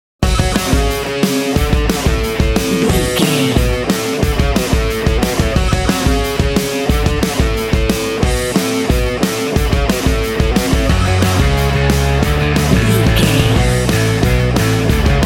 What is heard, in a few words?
mainstream pop punk rock full, Chart style Pop Punk rock music. A rocking music track with rock guitars, heavy rock drums and thumping rock